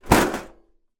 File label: leisure game rummy o tin down on surface; zapsplat